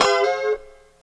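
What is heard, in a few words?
G 4 B mono slide L, guitars; Spectrasonics; Hans Zimmer Guitars VOL-1; 90's Sample CDs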